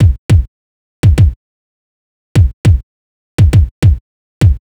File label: Kick, 102 Cmin, Drum Tracks; big fish audio; Hip Hop Exotica; Apple Loops